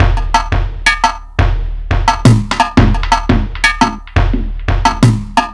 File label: Mixed Percussion, percussion loops; Drum n Bass; KJ Sawka; Drum n Bass & Beyond; Drum Tool Box